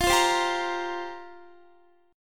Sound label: F Suspended 2nd Suspended 4th F down Guitar; Fsus2sus4; strummed